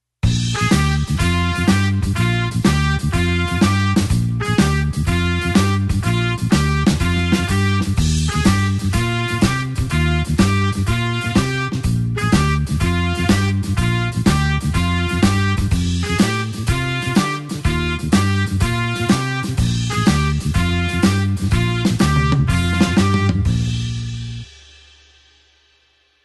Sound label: blues horns shuffle Bb